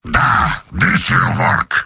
heavy positive vocalization; mvm

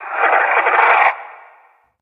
advisor screen; episodic; Source engine

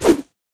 boxing gloves swing; tf

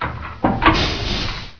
lever; buttons

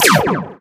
hitsound space

